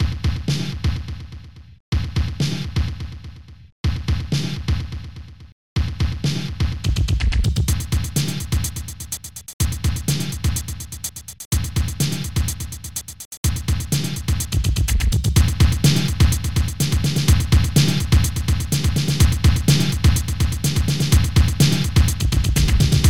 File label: Drum Solo; drumbum